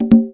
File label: Single Hit, Conga; percussion; musicradar